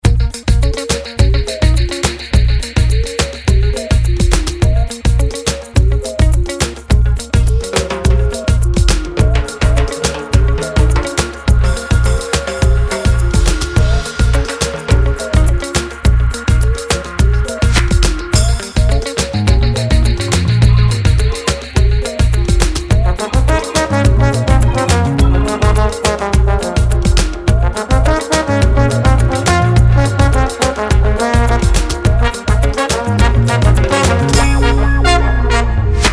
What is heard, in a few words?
Dub, horns; New Dub excerpt